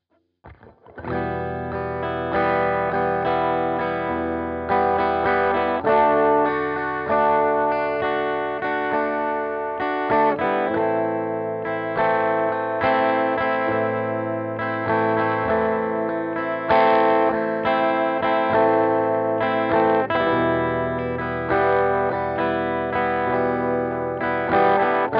blues track

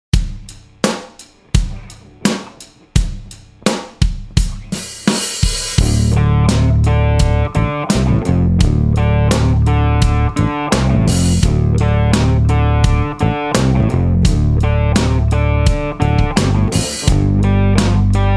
slide backing, guitar

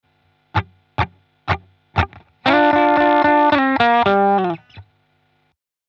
blues, guitar

